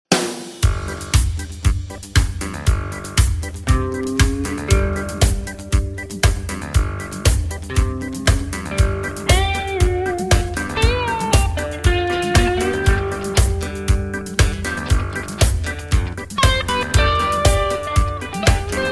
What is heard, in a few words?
(G) No Backing Vocals